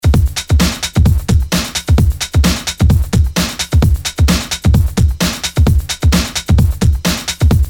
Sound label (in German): Loop unbearbeitet